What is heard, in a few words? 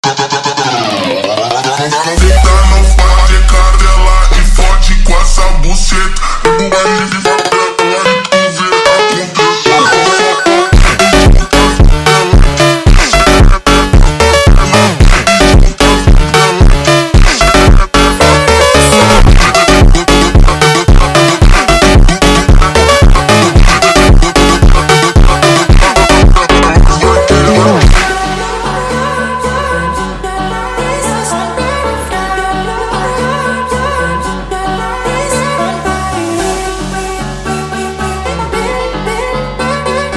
Phonk, Slowed